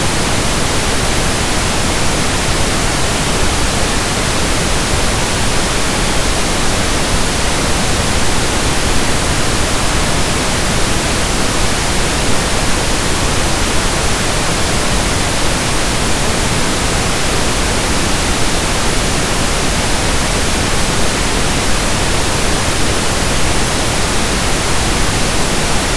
Pink Noise stereo (0dBFS peak) (-13dB rms) (CF 13dB); dimensionner ampli; Audacity